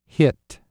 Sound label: Hit; Practice sound files to use for Praat scripts